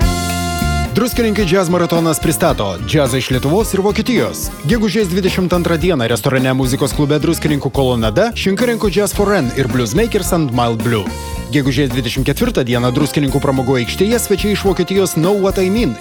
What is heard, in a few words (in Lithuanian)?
Jazz Maratonas; Druskininkai; Reklama priekyje ir gale